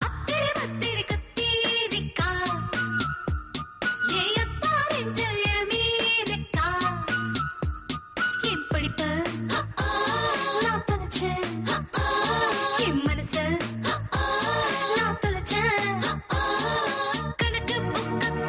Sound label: Tamil Ringtone